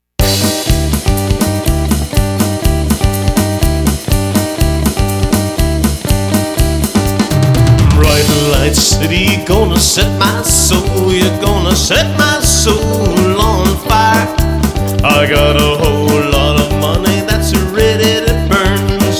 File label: Classic Rock Band